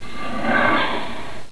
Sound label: breath; lmctf